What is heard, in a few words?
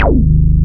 RESO BASS